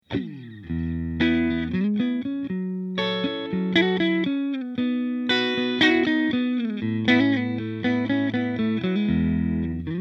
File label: stingray middle, Single Coils, guitar